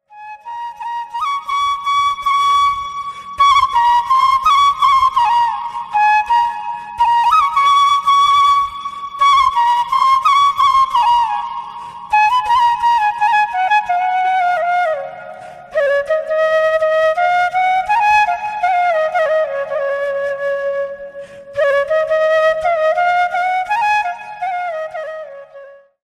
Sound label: FLUTE